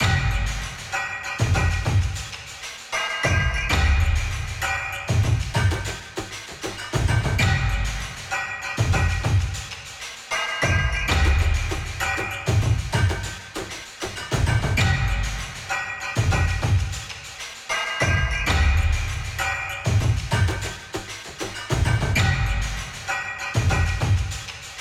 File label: Mus Action Perc Low 130